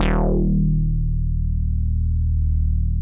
MINI TUJU E, MINIMOOG; Big Fish Audio - First Call Vintage Keyboards

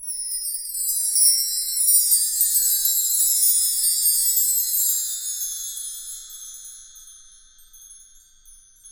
Wind Chimes; Roland LCDP03 Orchestral Perc

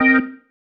Stab One Shots, Organ

